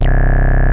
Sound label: HITEC C1, ANALOG BASS; Music Store - Claudius Diemeter Schwerlos